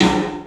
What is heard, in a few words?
PA DEEP L, CLUB SN VRB; 3D Sound; 3D Drums